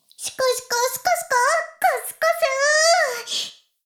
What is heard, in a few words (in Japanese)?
シコシコ音声; 古き城のドラゴニート2